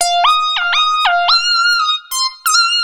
lead (funky riff); Sonic Foundry (Sony Creative Software); Ugly Remnants VOL-2; Leads

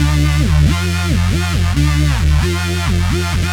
future rave samples, 136bpm, D

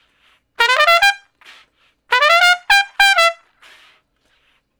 Trumpet Straight (E); Crimson Blue and Fabulous Horncraft 4 RnB; Horncraft for R&B; Solos

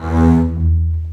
marcato, String; East West; The Ultimate String Collection; CBS MARCATO

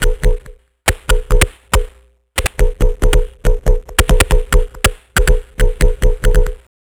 Structured Rhythm, IDM Rhythms

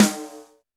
Snare, Roland TD-20

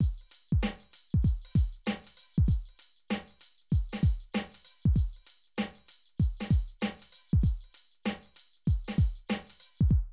Drum loops, Streetsoul

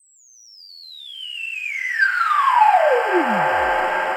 Synth FX

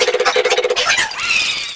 Fan Chant; Mario Paint

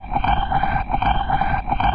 WEIRD DEVICE; ENVIRN-AMB

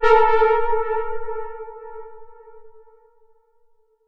Dark Chord; AMG - Prototype Drum & Bass

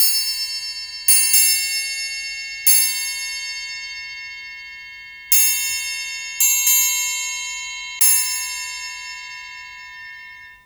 Cinematic Triangle; Sonic Foundry (Sony Creative Software); Orchestral Series VOL-3 - Cinematic

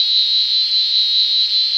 SCAN, Anime Sound FX, SCIFI

